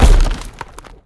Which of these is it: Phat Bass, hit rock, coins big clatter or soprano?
hit rock